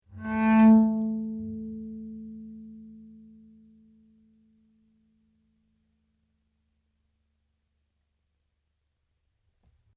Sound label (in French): Harmo arco